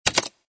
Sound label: button click big; croods